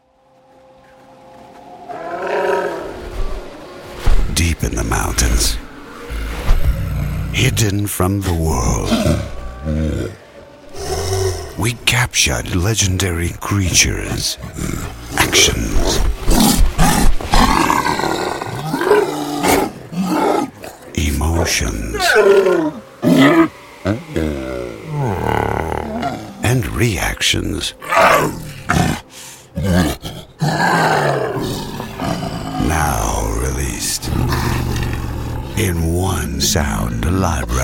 Yeti Monster; Articulated Sounds

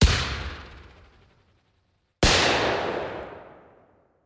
Guns; Casoundinc; Guns Library

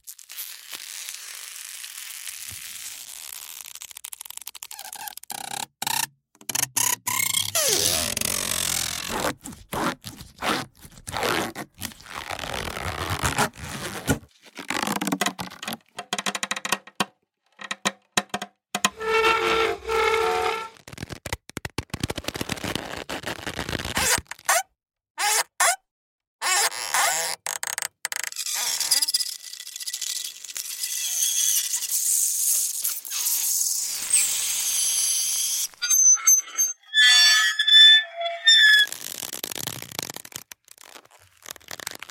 Unsettling Creaks and Squeaks; SoundBits

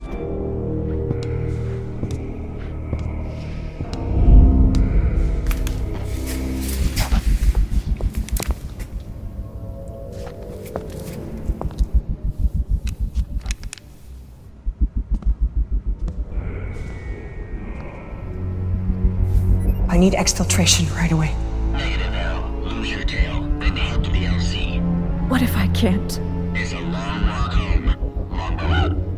Foley; impactsoundworks Cinema Sound Foley Library

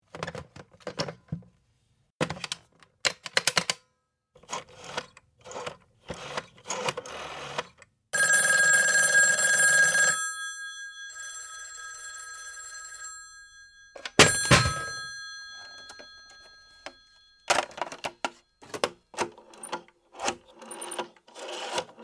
Rotary Phones; Big Room Sound

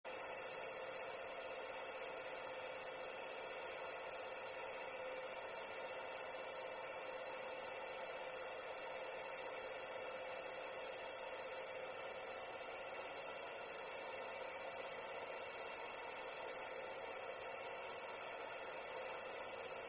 Essential Computer Sounds; Big Room Sound

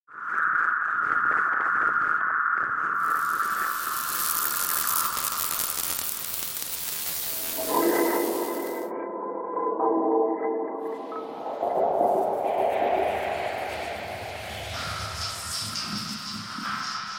Particles; SoundFxWizard

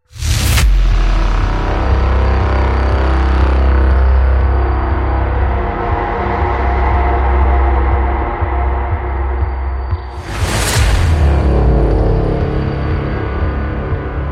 BRAAMS; SampleTraxx

